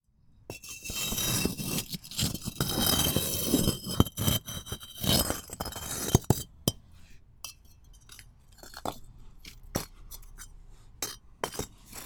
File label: Bricks Stones Tumble Rockslide